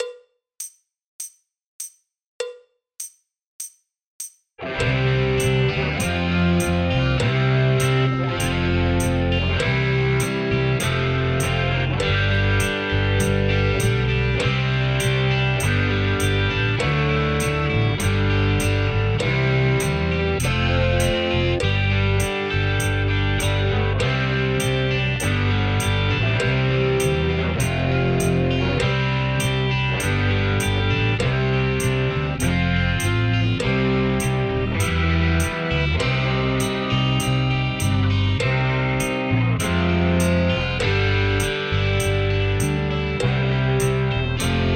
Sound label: Drum Kit playalong; MTB Exams; Grade One